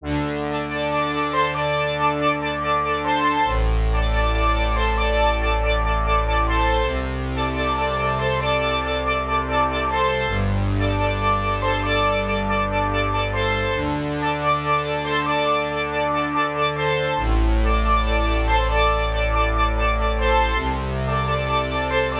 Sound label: Orchestral